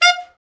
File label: BRA ALT, Alto Sax